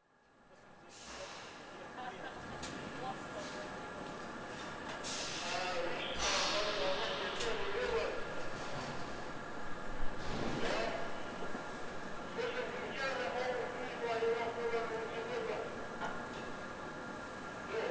tyre factory floor factory ambience indistinct voices on two way radio transceiver; forklift horn